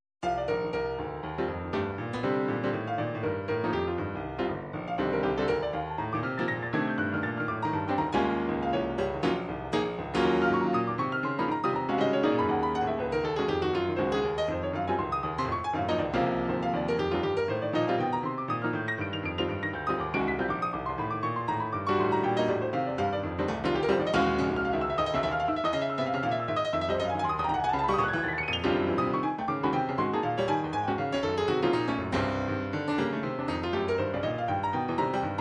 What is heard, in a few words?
jazz